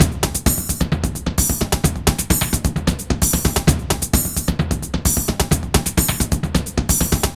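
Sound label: drum loops, right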